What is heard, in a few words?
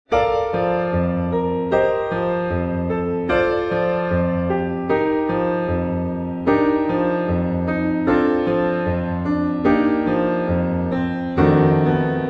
Romance Piano; Full Speed Piano Only